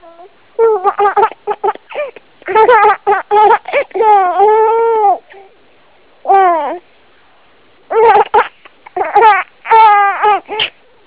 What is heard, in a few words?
Giggle, HaHaHaHeeHeeHeeHoHoHo